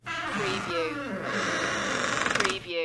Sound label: Crpy Dr Crks Opn